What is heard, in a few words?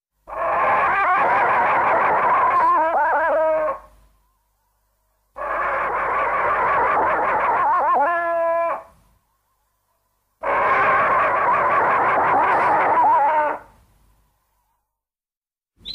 Roars; Gabara